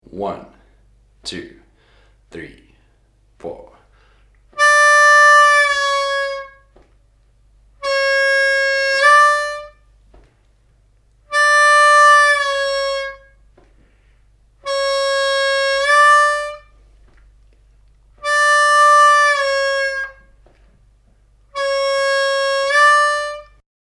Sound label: bends straight on exercise, harmonica